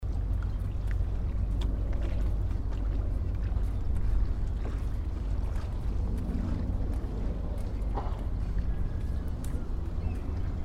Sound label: fishing port; Nishiura